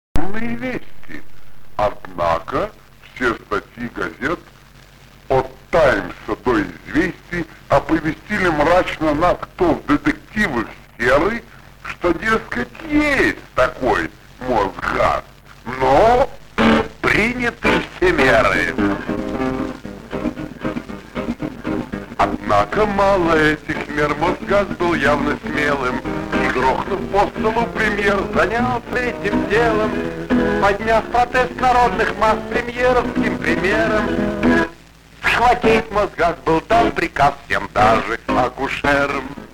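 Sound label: guitar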